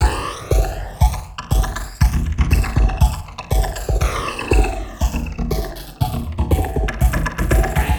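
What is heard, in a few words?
Loop, beats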